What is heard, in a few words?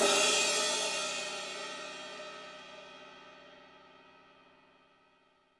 TD-20 HiHat & Crash; HiHats; Crashes